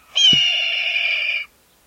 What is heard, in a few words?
red tailed hawk